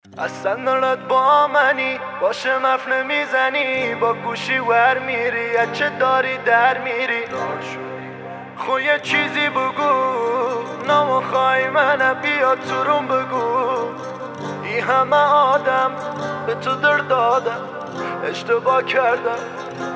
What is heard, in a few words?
Version Guitar